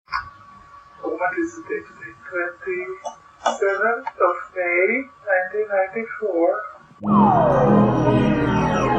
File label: Slowed Down Effect